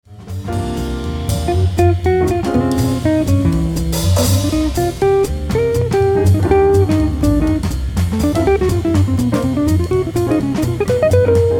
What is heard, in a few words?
solo